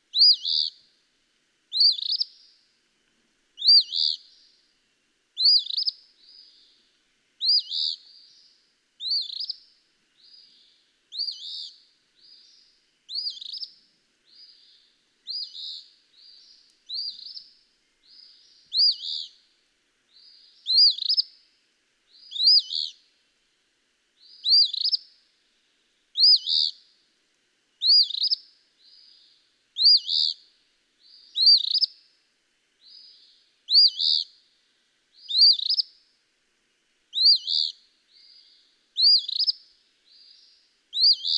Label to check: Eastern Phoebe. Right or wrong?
Right